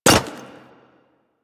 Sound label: Hyena Micro Step Default, Footsteps; Warframe